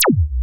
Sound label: LAZER ZAP; Rubber Chicken Software Sample Bank; DRUMS + PERC